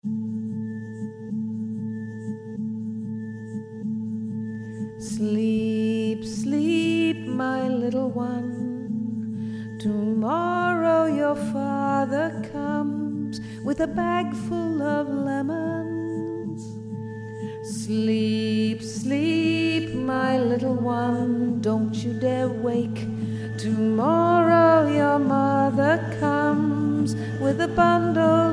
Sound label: Lullabye